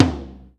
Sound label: Tom Low